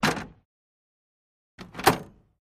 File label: Model T Trunk Catch, ford model t